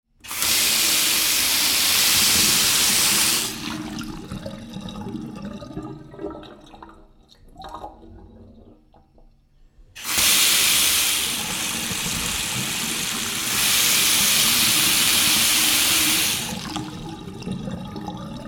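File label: Taps Turned On and Off - Powerful water from taps; water runs down plug hole and away; Miscellaneous Household; Tom Hutchings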